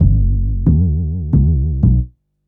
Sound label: Upright Synth Bass, Drum & Bass; Fluid Dynamics